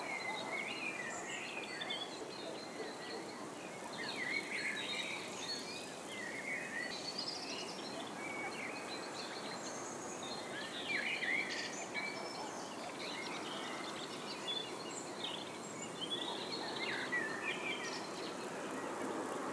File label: summer evening, Birds; Ambient; zps